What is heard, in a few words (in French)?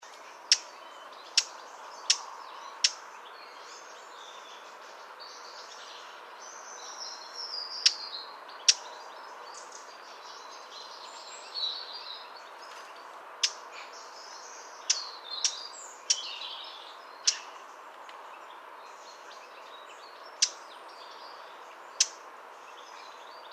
fauvette tete noire femelle cri; oiseaux